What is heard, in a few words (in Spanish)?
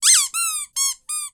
Sonido Peluche